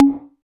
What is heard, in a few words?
hi-percussion, techno; Techno ID; single-drums